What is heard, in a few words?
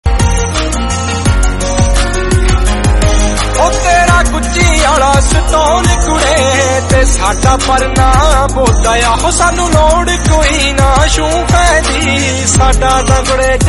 punjabi